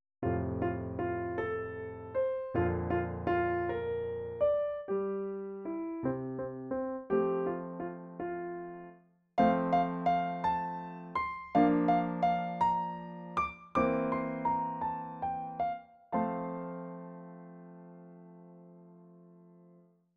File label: The Trumpets; 33rd Annual International Composition Festival